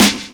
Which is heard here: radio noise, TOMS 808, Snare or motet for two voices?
Snare